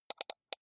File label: computer mouse left click double soft